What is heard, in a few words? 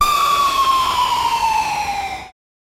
a high quality powerful c, beam